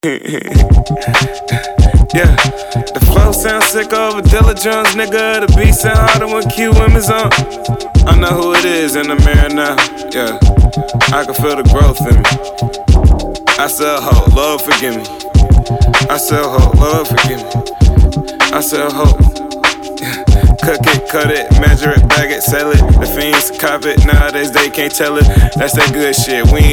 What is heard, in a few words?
Drums; Dilla Drums